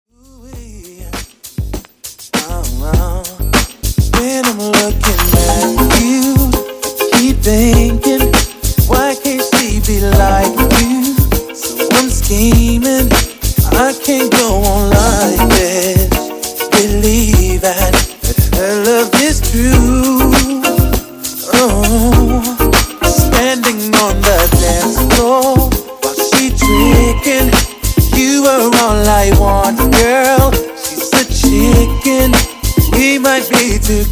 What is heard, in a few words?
Mashup, 100 Bpm